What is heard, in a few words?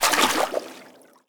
Foil, Footstep